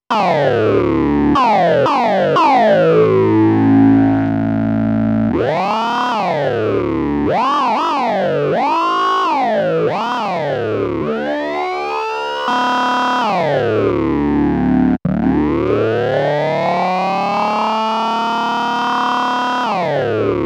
mpc5000 bass filter vocal; akai

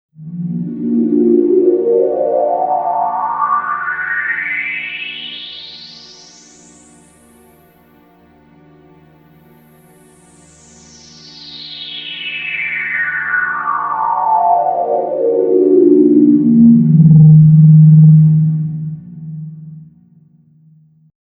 sweep

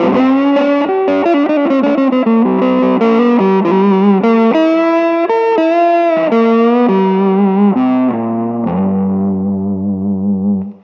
bluesy reverb, guitar; native instruments; guitar rig

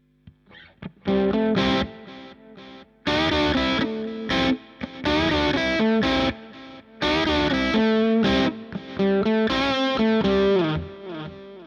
delay duck; bogner alchemist

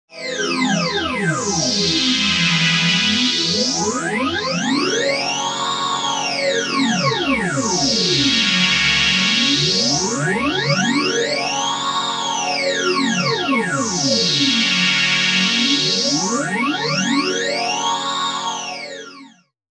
crumar multiman hard phas; gforce vsm

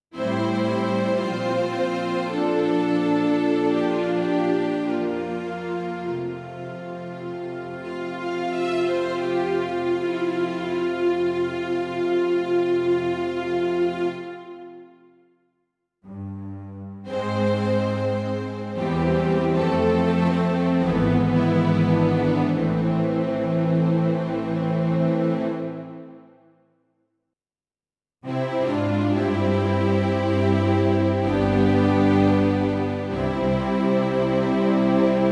strings, yamaha tyros3

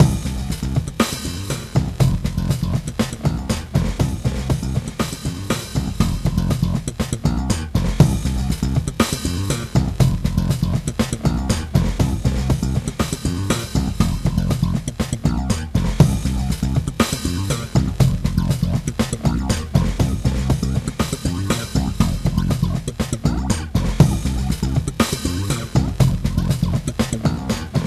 virtual bassist fx; steinberg

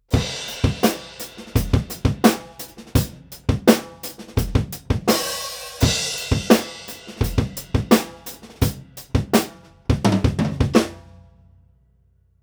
drums; neve; AMS-Neve; batterie

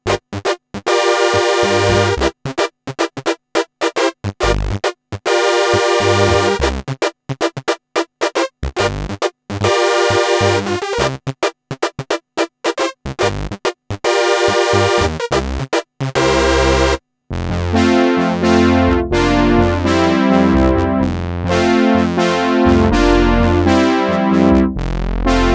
jp 8 brass; Jupiter-8; cuivres; arturia origin; sans aucun effet

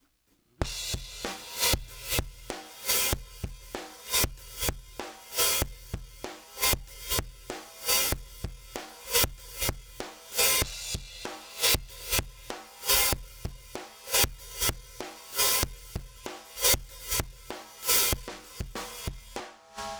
drum

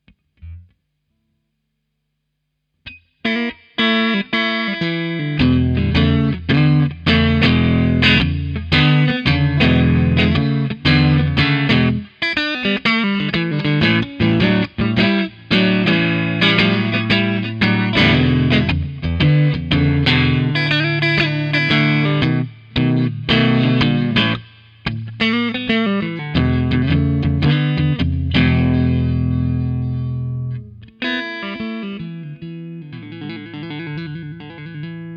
strat twin; squier classic vibes

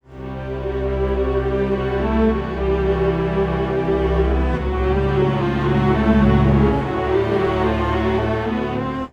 2, strings, pitchshift; serato pitch n time pro

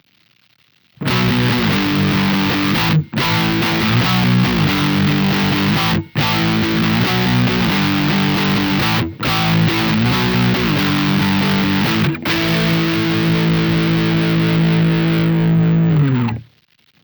lead midle gibson; vintage

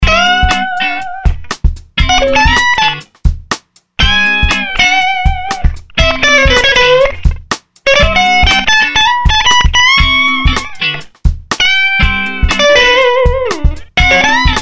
vox fender; m-audio; blackbox

